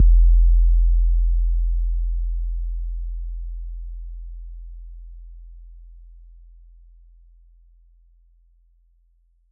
808s

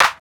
Open Snares

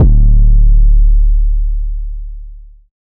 808 D; Cymatics

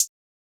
Hi-Hats, Closed Hats

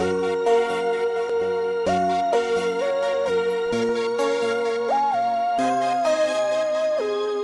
Core Sample 129 BPM

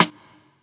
rim

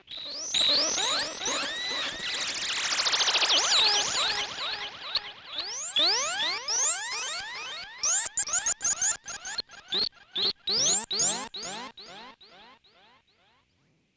Swarm

